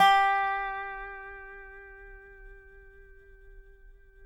DOBRO NOTES; Sampleheads; Whole Lotta Country 1 & 2

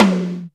TOM HIGH, PERCUS KIT

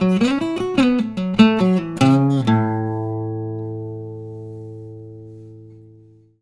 licks, Blues Guitar; ILIO; Fretworks

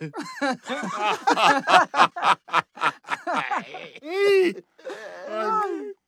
Vocal Chirp, African Voices; Quazibeat; African Secret

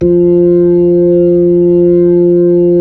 B-3; Roland LCDP10 Keys of the 60s and 70s 2

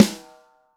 Snare, Roland TD-20

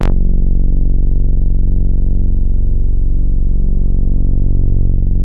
BOUNCE, BASSES

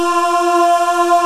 MALE CHOIR

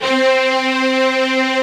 STR 2 SEC C3, HALL STRINGS; AKAI S-Series CD-ROM Sound Library VOL-7

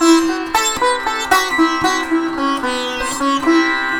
SITAR, Indian Traditions

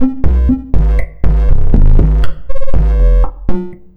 Structured Rhythm, IDM Rhythms

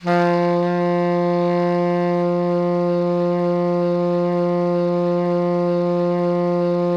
BARI PP F2, saxophones; Greytsounds - Philip Wolfe David Crozier's Saxophones; BARI SWITCH

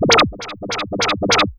GALLOP; AKAI Anime Sound FX; ZPINZOUNDS

